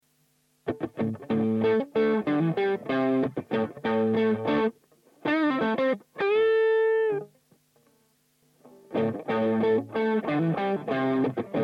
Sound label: bbp comp ts10